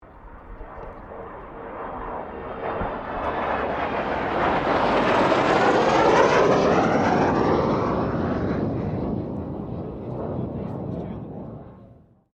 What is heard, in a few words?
1997 BAE HAWK 127 PASS; Airplanes; Vehicles